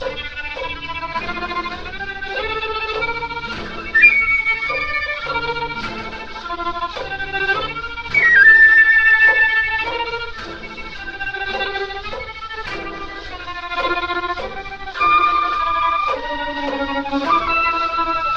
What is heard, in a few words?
strings and flute creeping slowly, sad loop; Loops